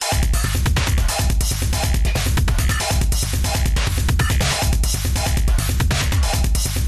musical drum loop electronic 140bpm; Audio Mangler

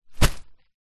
foley flowers fake plastic set down carpet

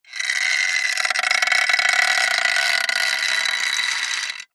metal stress creak thin tone; zapsplat

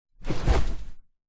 vehicles car person sit in seat

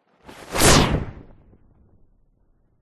superhero fly by fast whoosh cape; zapsplat

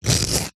rock or stone single hard scrape cut score knife; foley; zapsplat

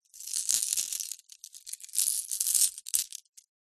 gemstones in small pouch tip out; zapsplat

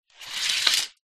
household curtains net open or close

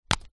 household dental floss plastic lid open; zapsplat

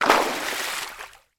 water movement fast; sound effects one

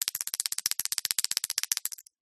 fast rattling; the sound pack tree

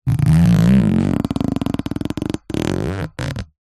rubber tape stretched across metal squeak rub; zapsplat

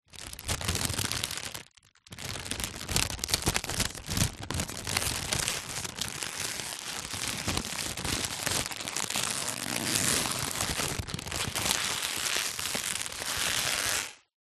packet plastic crisps multipack empty handling; foley

